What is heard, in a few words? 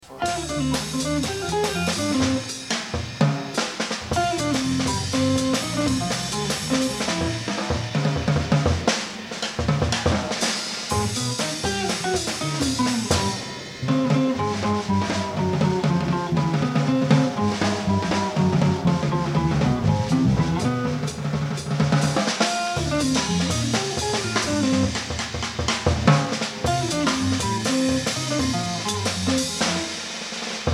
Drum; Demos & At The Hole 3-11-94; Drum Featurette